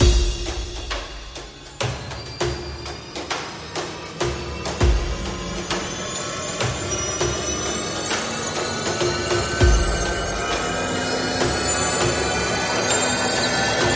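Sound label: Percussion and FX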